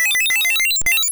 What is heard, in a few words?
Night Shade